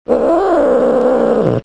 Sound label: Cat Growl; Animals